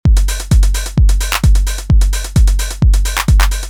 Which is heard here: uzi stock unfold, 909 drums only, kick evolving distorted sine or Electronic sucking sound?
909 drums only